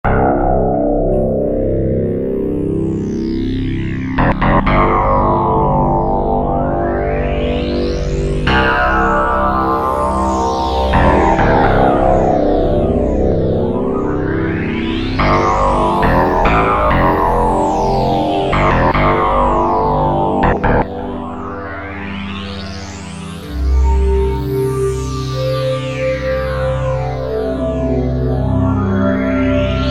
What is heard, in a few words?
suspense